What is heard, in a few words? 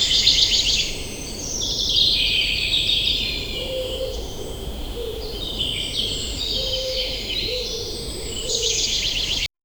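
pure forest ambience early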